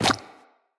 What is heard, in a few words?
Run Goo, Footsteps; Tenno